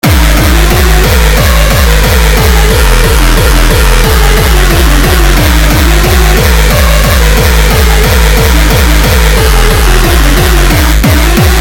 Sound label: jumpstyle